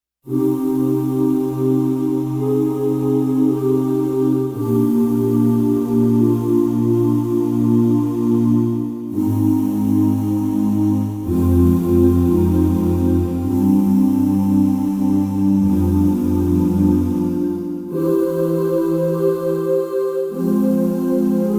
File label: Huh Choir